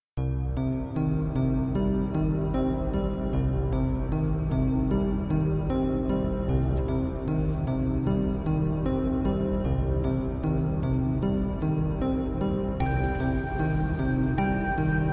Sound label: Ambient Space Music